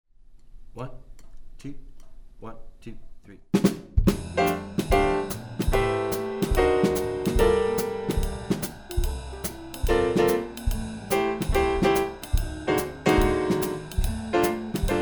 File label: swing etude rhythm section bass muted